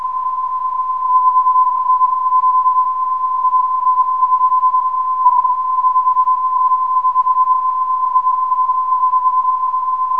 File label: ku 449khz, ndb